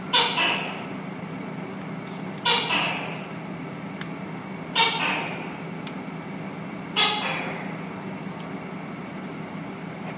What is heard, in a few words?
tokae voice